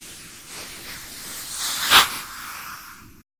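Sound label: bite with breathing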